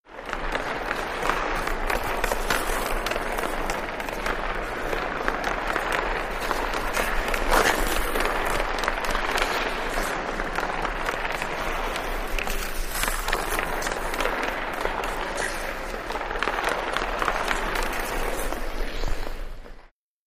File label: Hockey Player Skate